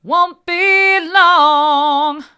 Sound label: wont be long hard, vocal